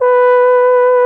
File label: BARITON; Best Service; Alpine Volksmusik